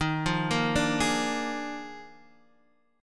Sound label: D sharp Major 7th Suspended 2nd Suspended 4th, Guitar, Half Step Down